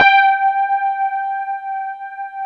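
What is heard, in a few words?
Fender Rhodes G4; Keyboards Old School; USB Soundscan vol.09; AKAI; FEND.ROAD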